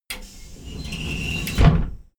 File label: train intercity train hydraulic slide door close short